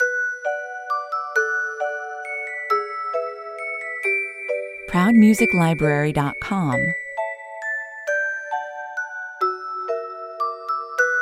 (glockenspiel) 134 bpm; Proud Music Library

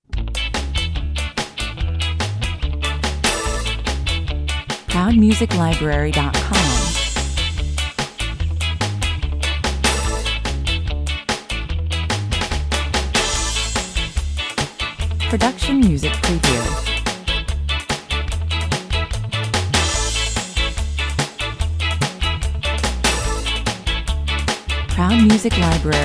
ska, 145 bpm

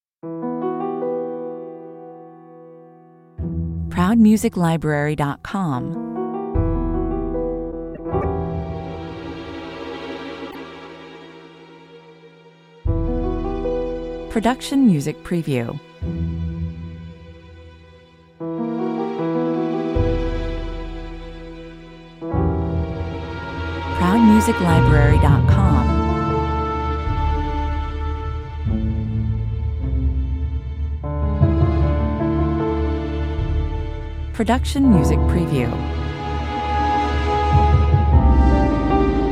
fear (orchester) 76 bpm

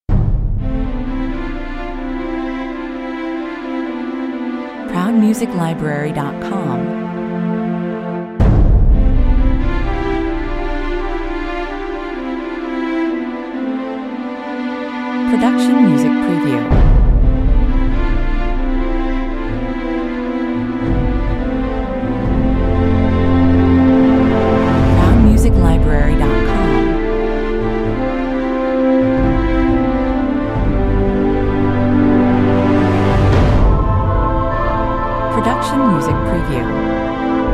temple fighter 140 bpm

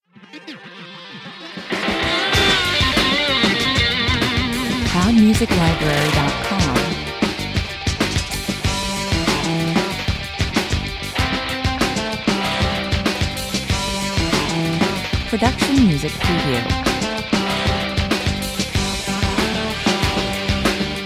muscle car 95 bpm; 60s 70s muscle car

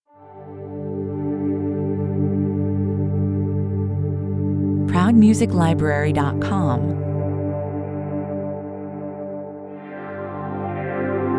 space chord

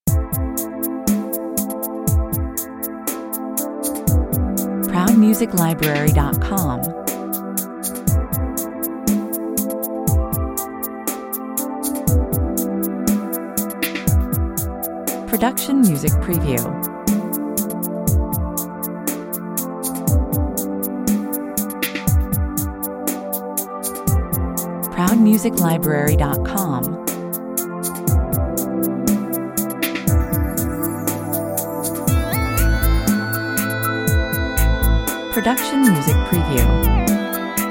beneath the ocean 120 bpm

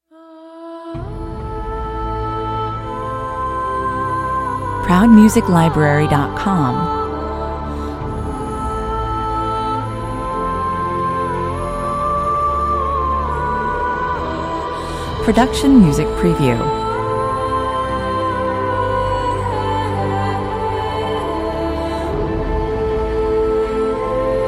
(with choir and solo voice)

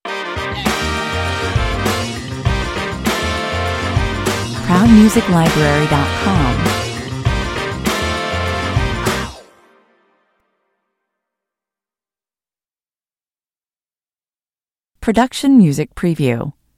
funkin yell stinger

